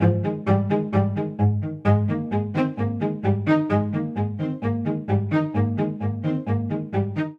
Ostinato Strolling Ab Major 130 bpm, String Quartet; MIDI Clips; Ableton; Spitfire Audio